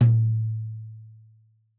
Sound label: Tom2 Stereo, Deeply Sampled Drums